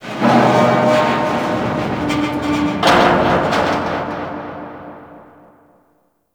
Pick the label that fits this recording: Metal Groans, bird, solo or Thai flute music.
Metal Groans